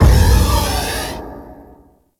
Reactor Piston Move; Ambience; Warframe; Orokin

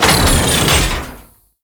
Gatling Gun Deactivate; Warframe; Tau Gatling Gun

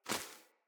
Wing Flap One, Animals Foley; Floppet

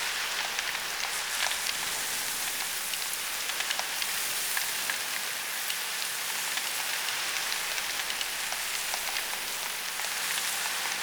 Tree Rustle Loop; Dagath; Warframe; Ambience; Clan Dojo; Dojo Props

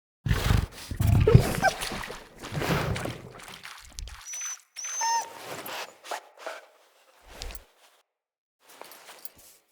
Wolf Cub Select Spirit; Orengall Quest

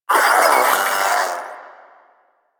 Proto Infested Maggot Awake, Vocals; Warframe

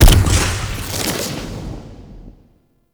Noctua Alt Shot Punch

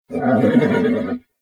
Horse Vocal Mount Neigh; Vehicles; Vocals; Warframe; Duviri

